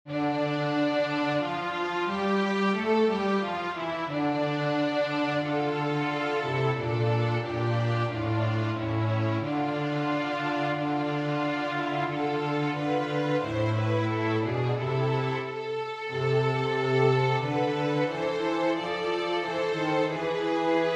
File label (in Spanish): ACOMPAÑAMIENTO; Te Joseph Celebrent